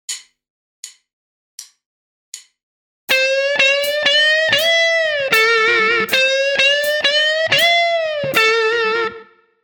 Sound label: blues tricks, guitar; eight essential lead tricks